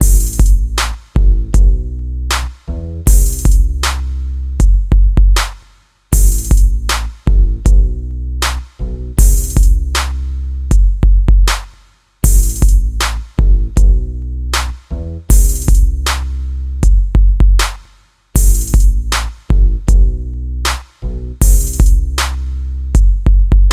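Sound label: rap, ringtones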